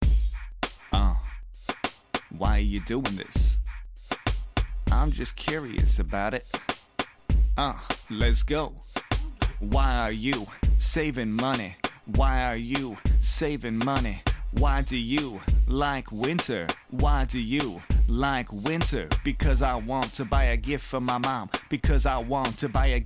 영어남 랩; 남자

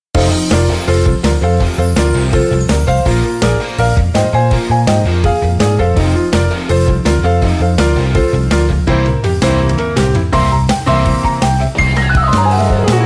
영어 여 동요 Phonics; NekiPhonics

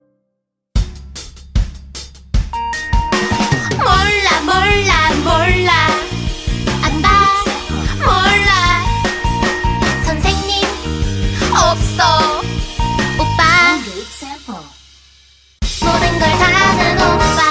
중국여 한국어노래

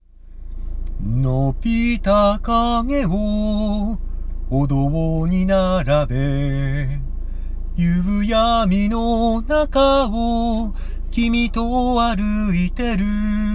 일본남 노래

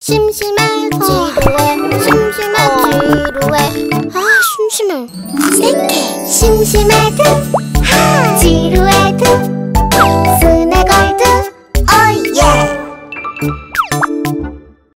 게임송; 스낵월드; 교실편

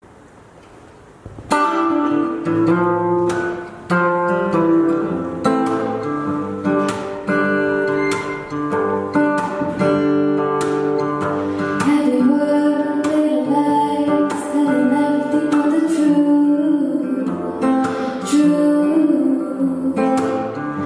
호주여 노래

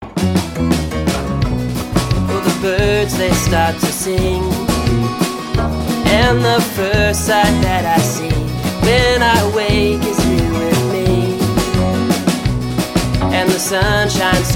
영어남 노래

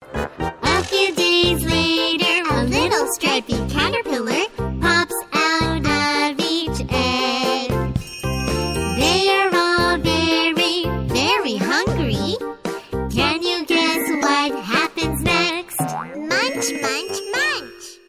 영어여 동요

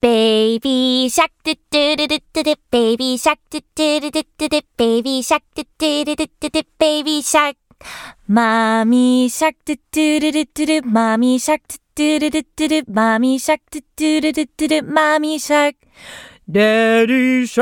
영어 여 동요

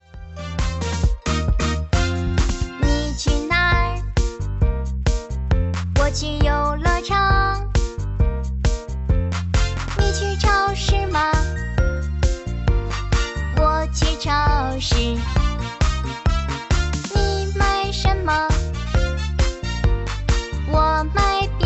중국여 노래

